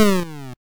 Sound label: shoot, attack sound; カタナ１